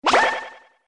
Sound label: fly guy dead; Звуки из игры Марио